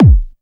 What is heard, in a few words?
bass drum deep, techno trance drums; Ueberschall; Techno Trance Essentials